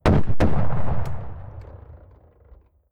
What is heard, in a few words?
distance artillery unit fire; Hearts of Iron IV Death or Dishonor